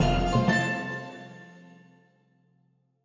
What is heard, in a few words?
Win sound